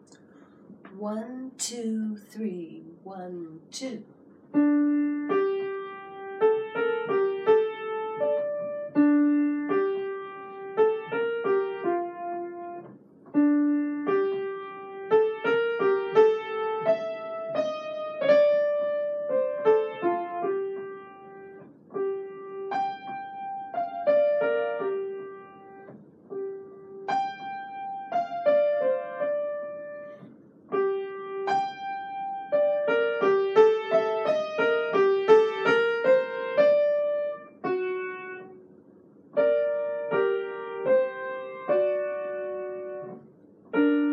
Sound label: Creole Lullaby RH only